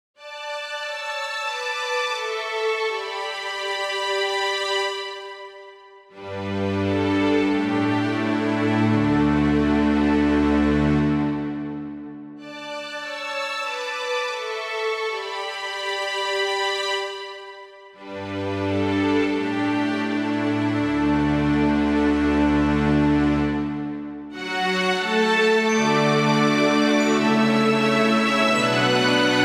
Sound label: Mini Orchestra